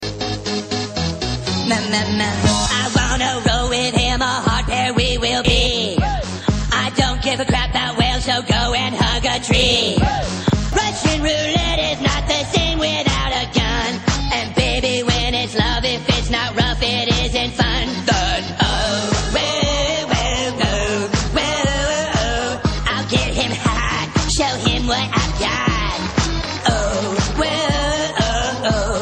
Singing; Cartman

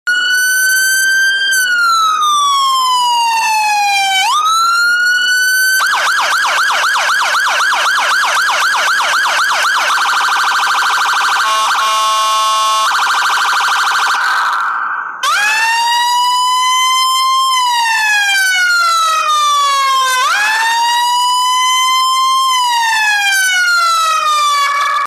Dodge charger siren

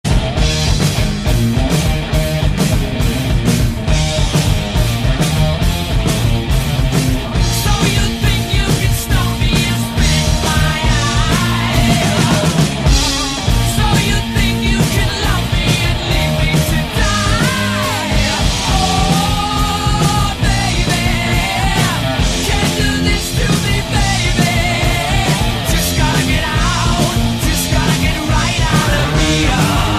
Bohemian Rock

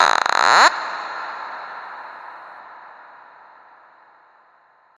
Notification

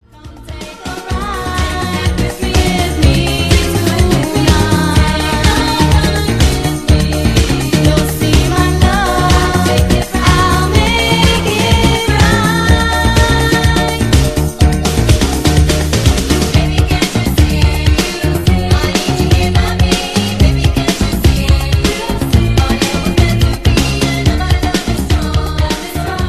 Latin Freestyle

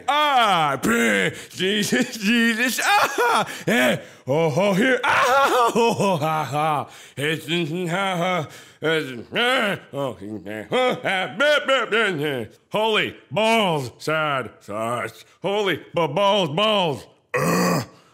Outro noises

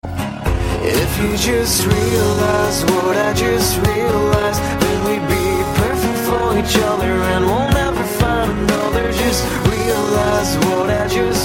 Male Version

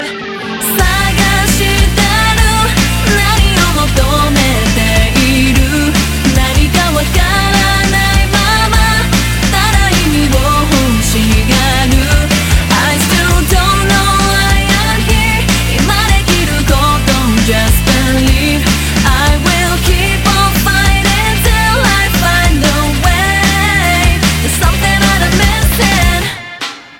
Awesome J-pop

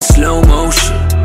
Slow Motion Message

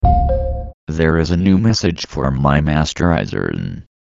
New Messages

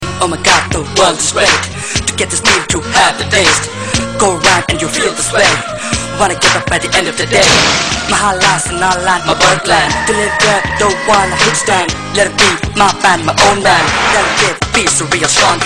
Rap Bit; Ayan

